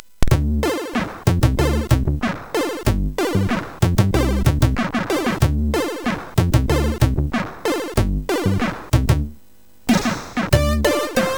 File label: JAZZ VIB, MOS6581R4